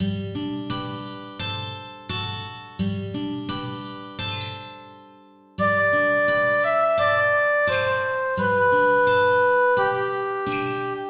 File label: bgm roll; Adventure Bar Story; nintendo 3ds